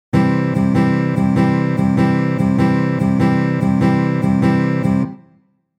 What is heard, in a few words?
stroke, guitar